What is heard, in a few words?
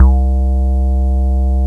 BASS